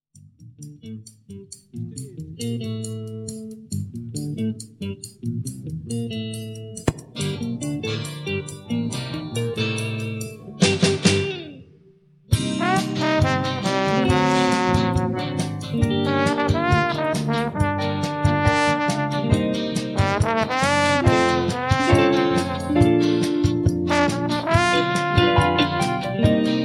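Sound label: orkestr, orchestra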